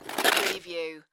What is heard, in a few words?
Snow and Ice, Ice scrape moderate